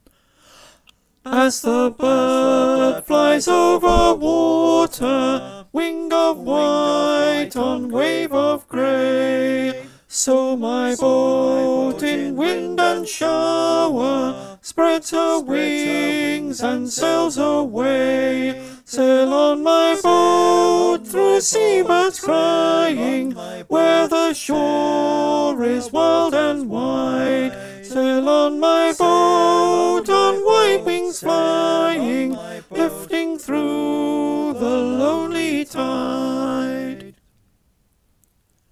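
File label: Alto, choir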